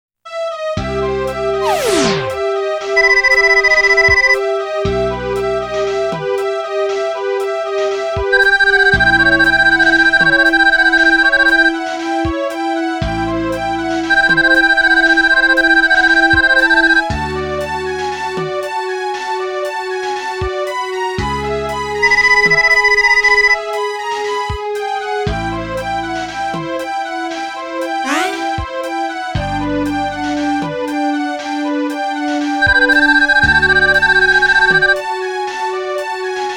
Message (Sad); Rockman 7

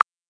Sound Click; Learn to Fly 3

